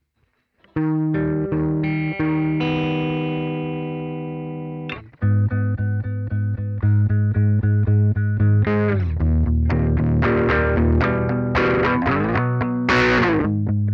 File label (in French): guitare